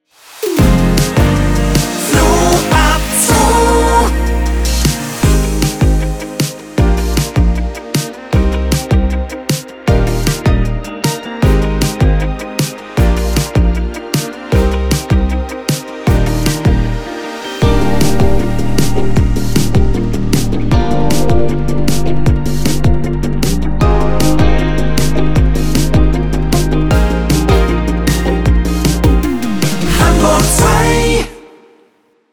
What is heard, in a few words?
Daytime Opener; Hamburg 2